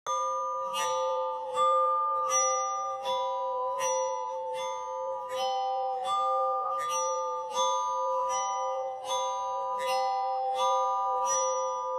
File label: ambient